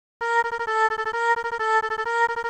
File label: 3x Osc